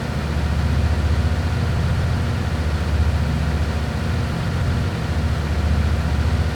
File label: Sound Chiron Low; car simulator 2